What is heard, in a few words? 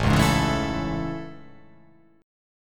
A Major 7th A